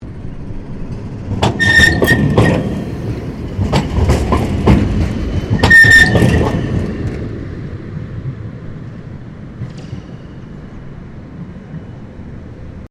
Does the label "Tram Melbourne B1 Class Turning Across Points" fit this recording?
Yes